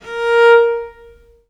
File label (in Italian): A#3, Historical Instruments, VIOLE MUT; USB Soundscan vol.23; AKAI